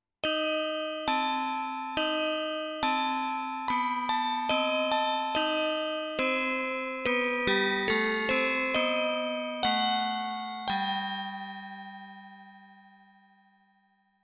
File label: Clear Bells